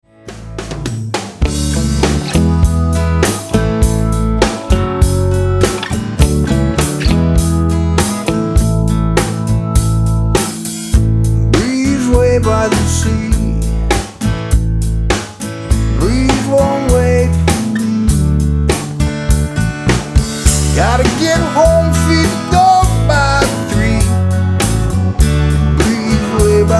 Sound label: Sample Dog Song